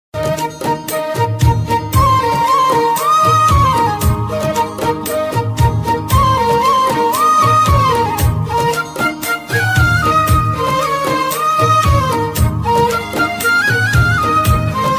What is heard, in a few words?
tamil love failure bgm; yaaradi nee mohini